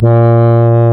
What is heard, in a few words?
A#, Synclav FM, BRASS; Big Fish Audio